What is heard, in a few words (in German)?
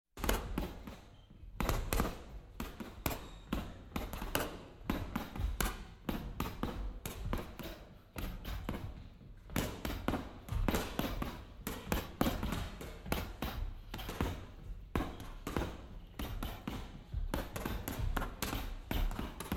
Boxtraining Am Sandsack; Sport